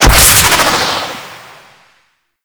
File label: Boost Thruster; Warframe; Archwing